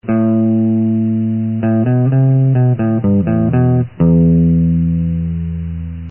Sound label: bass